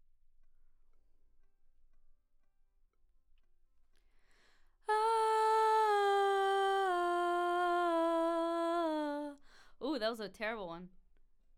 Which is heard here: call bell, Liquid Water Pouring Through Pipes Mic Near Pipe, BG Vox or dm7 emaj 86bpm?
BG Vox